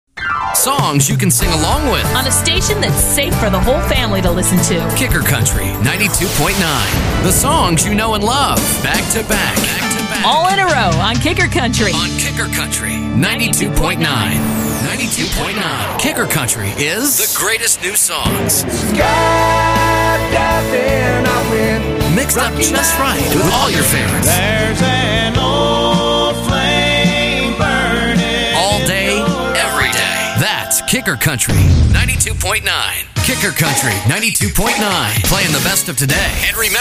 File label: kicker country